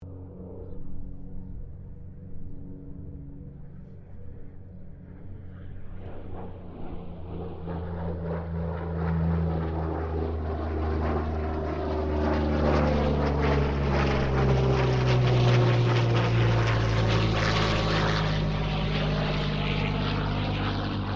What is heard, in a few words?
AIRCRAFT P-40 KITTYHAWK PASS STEREO